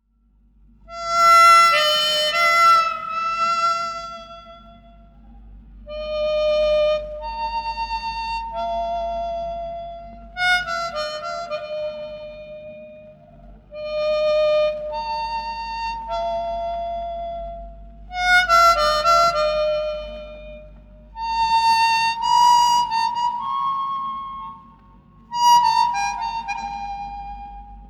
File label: Music; Kachche Heere